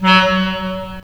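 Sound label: wind clarinet; Roland - LA Composer vol. 3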